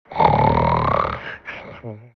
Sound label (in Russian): hrap